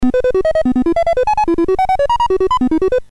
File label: Music Game BASIC, sid MOS6581R4; hvsc